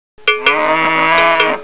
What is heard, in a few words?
cowbell